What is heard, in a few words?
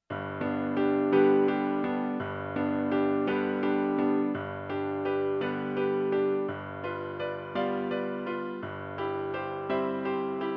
Ave Maria Piano